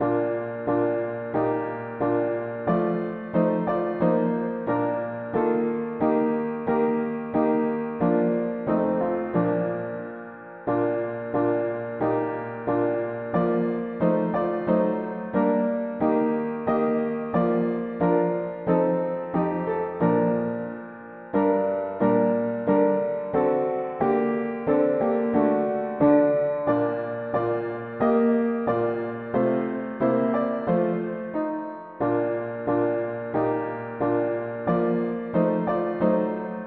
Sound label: mono piano, hymns; Love divine all praise excelling